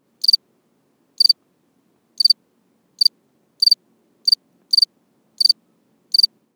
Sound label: Acheta domesticus